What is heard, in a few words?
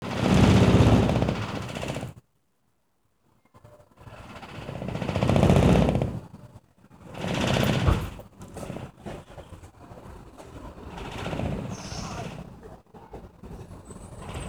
rambling roof